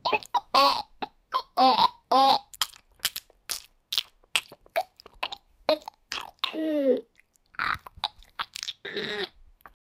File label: big bite sound loud chewi